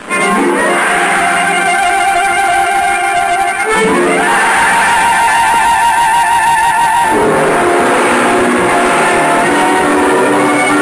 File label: mysterious music